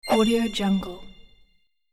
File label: magical arrow flight